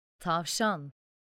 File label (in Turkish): Tavşan